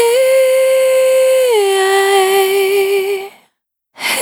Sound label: Vapor Dry, Single Voice Melodic Loops